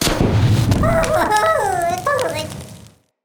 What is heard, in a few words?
Molten Mote; Motes